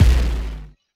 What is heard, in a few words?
Bomb Explode